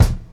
crispy kick drum one shot C key, kickdrum tuned to the C note